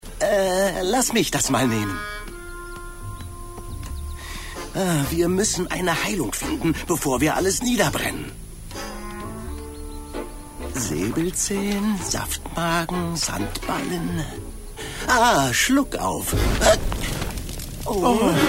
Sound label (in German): Schluckauf